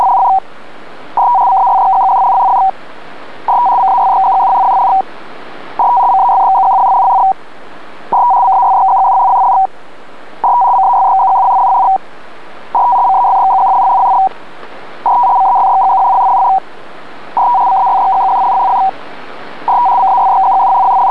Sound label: pactor; rfi